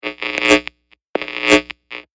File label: Electric Crackle Swell Multiple; High voltage crackling buzz swells.; Electrical Sound Effects; LYN111 package of Electricity